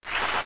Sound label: TAP